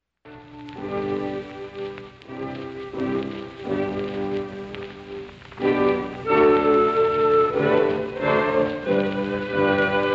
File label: Jingle; Anti G8; USA; Heiligendamm 2007